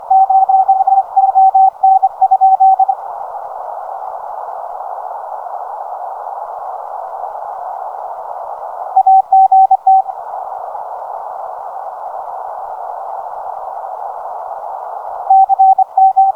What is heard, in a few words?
Doppler; Svalbard Island; JW7QIA